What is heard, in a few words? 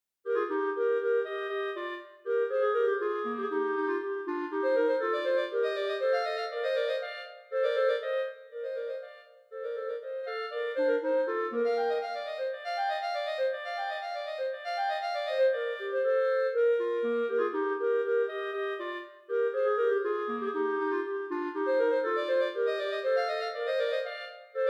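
duet, 2 clarinets; molter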